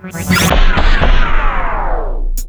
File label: s power off bad